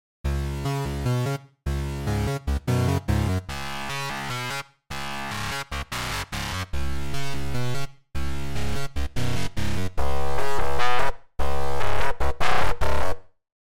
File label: sandwich amp